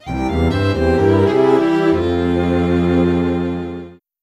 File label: Confession Failed